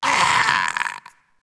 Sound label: gorefast hurt; kf2; gorefiend; slender